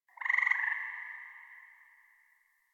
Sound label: Forest Distant Frog Call Resp; Warframe; Grineer Forest